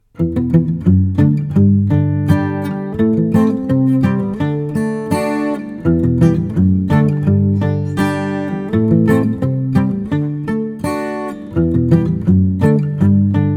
Country Folk Variation

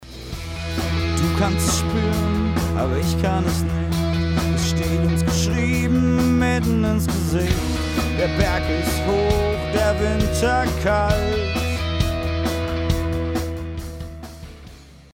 Vocals Original

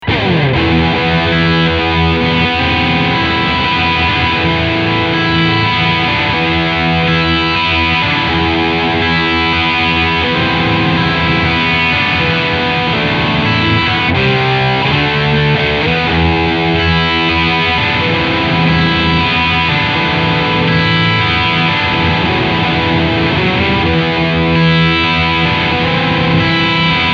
Overdrive Riff

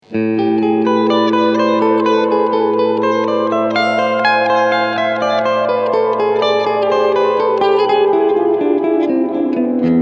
Plate tone closed

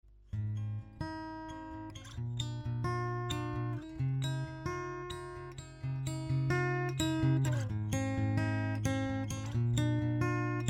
114ce DI Fingerstyle; LTD

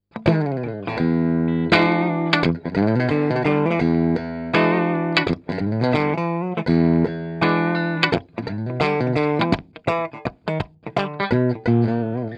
Clean Bridge PU Humbucker

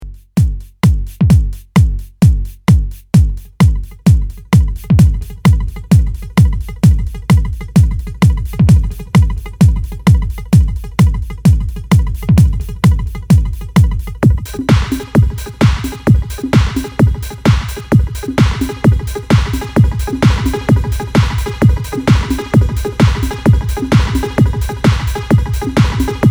Lexicon PCM70 Resonant Chords

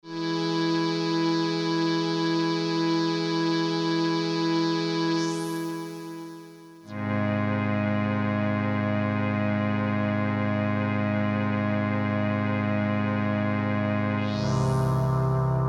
Kijimi SRE330 3Phase Mode

